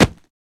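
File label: Hit Soft Single, cloth movement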